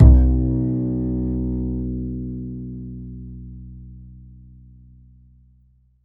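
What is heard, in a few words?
double bass B2; Univers Sons; Jazzistic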